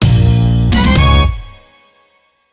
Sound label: concept stinger birdie